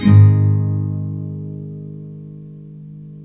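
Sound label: NYLON, G# MAJOR UP, CHORDS; Spectrasonics; Hans Zimmer Guitars VOL-1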